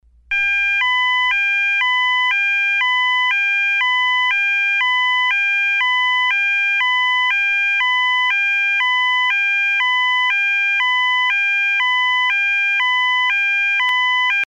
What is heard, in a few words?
WARBLE